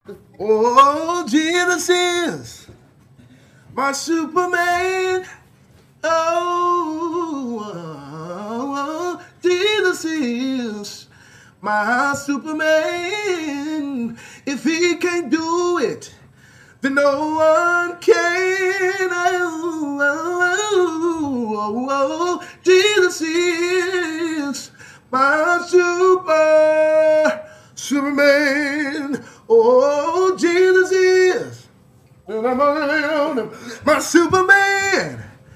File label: Singing and Preachin